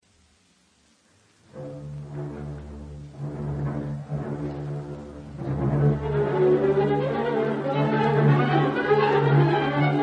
String Quartet in F sharp minor, orch., Sehr rasch; Schoenberg; String Quartet No. 2; Op. 10